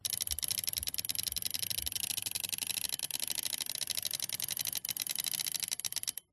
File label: typewriter ribbon spool movement ratchet; mkh8040; pmsfx